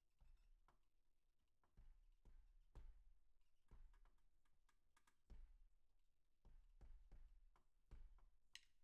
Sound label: Ride